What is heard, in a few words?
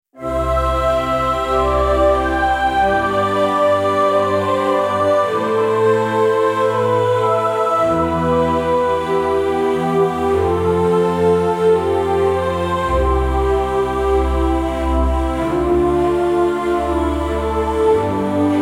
95 bpm Orchestral Choir Melody, instrumental; 74 sec; ai social media music